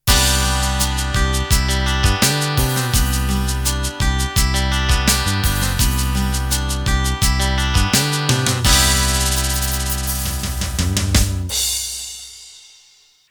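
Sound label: Rock Ballad Intro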